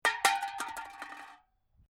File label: Metal Can Empty Beer Can Thrown On Rocks Settle; Good element in design of junk crash.; Metal Sound Effects